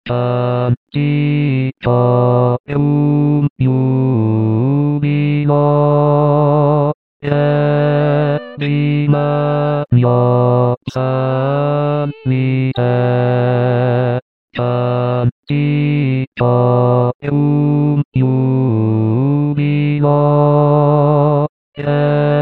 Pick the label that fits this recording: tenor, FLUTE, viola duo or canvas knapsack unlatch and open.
tenor